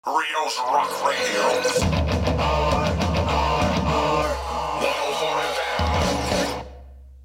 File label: Radio Car Rock Jingle